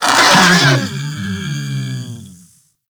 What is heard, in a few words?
Kubrow Armored Vocal Death; Warframe